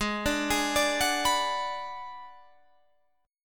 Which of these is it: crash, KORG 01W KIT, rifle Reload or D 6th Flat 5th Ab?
D 6th Flat 5th Ab